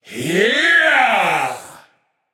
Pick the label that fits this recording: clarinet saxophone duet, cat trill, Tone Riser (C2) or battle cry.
battle cry